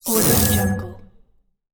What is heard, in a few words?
ui swell